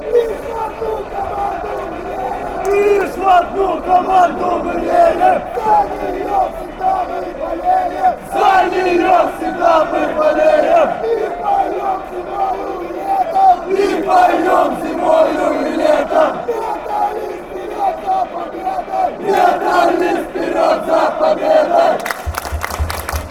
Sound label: chant; metalist